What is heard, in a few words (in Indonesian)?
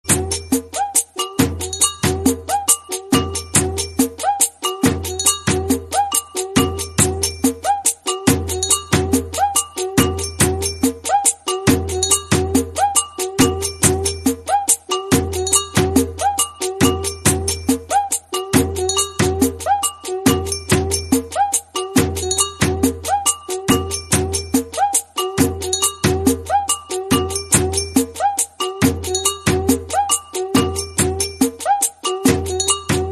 nada dering samsung Galaxy S3